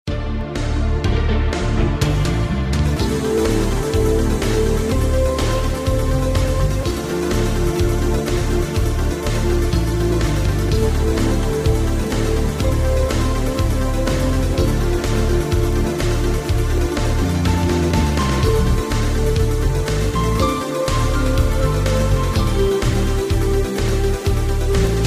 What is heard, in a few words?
Dual Soft Tone